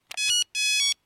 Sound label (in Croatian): Pozivnik swissphone